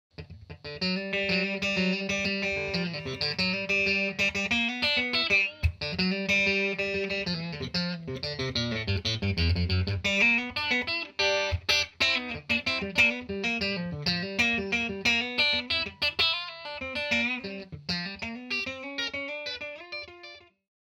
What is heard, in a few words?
guitar pickin